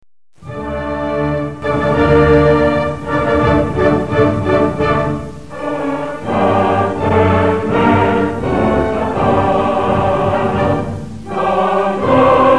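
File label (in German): National Hymne Türkei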